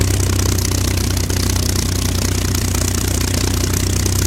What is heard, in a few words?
mustang side, engines; War Thunder